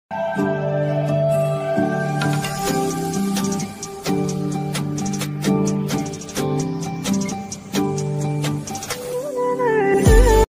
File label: beautiful flute music ringtone